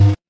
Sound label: retro game coin